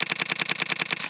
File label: camera burst click; Huawei; Ascend HW-01E; ui